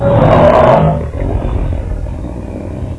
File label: Shark death